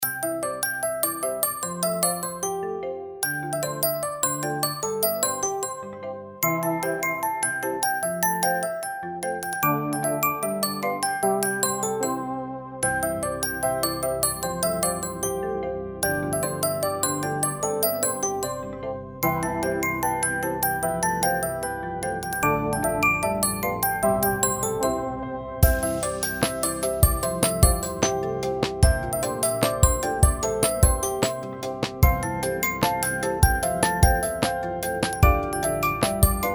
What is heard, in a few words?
Traditional vibe groovy version, ringtones; Vsmart; Star 4